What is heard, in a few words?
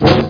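door hit; rott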